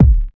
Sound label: warm bass drum one shot D# key, Royality free bass drum single hit tuned to the D# note; kick drum; D sharp key